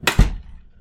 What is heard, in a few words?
Oven Door Peek Close; Kitchen; Turkey Cooking Simulator